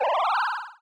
Battle Heal; Era's Time Delivery Service

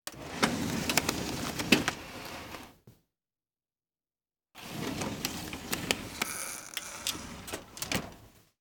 Doors and drawers Metal Cabinet Drawer

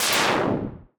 Battle Hit Heavy; Era's Time Delivery Service

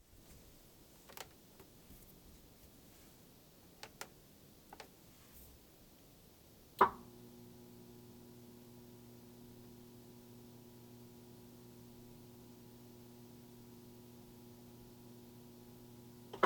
Washing Machine Initialize; Tools and machines

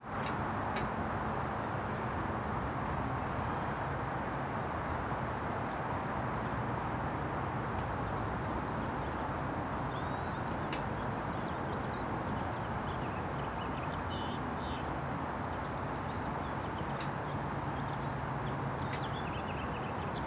Ambiences Suburban Walnut Creek Ambience

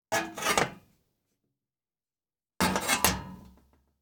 Heater Vent Latch; Switches and levers

